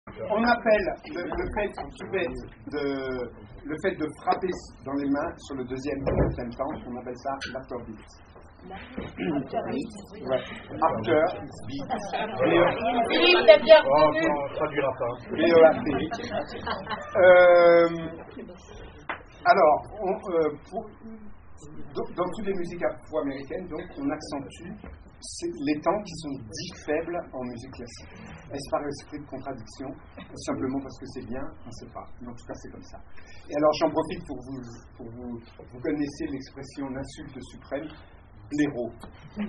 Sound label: Afterbeat, TECHNIQUE VOCALE